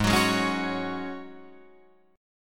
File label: G sharp 7th Suspended 4th G sharp, Guitar Drop D